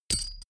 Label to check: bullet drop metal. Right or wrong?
Right